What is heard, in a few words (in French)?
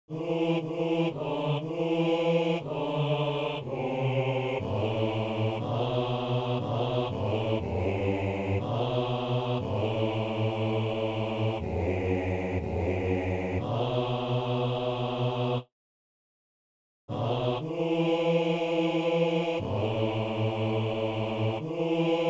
basse; Saint-Denis